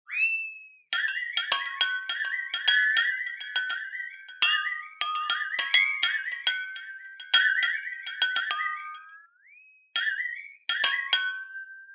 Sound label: FLEXATONE